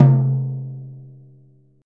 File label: classic deep tom drum c key, Royality free tom sample tuned to the C note.